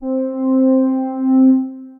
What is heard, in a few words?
Retro Arco Pad, Synth Pad; Ableton; Creative Extensions; Racks